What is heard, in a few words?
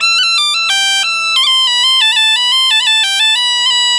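bagpipe 90, Celtic Flavours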